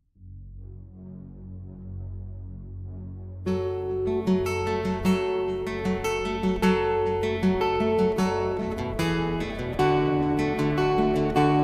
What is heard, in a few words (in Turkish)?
akustik; joyturk